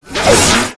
ATTACK

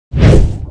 ATTACK

